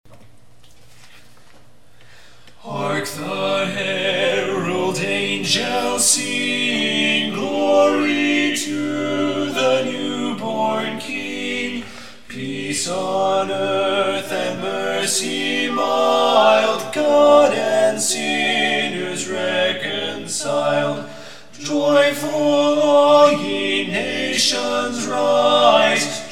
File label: lead; hark the herald angels sing